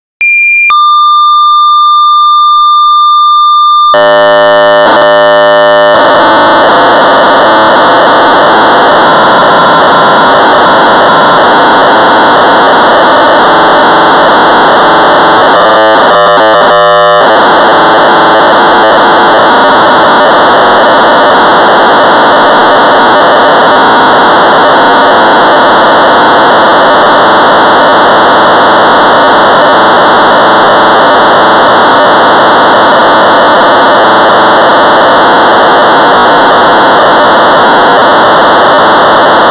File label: Sorcerer 1200 baud; Lem; Creative Computing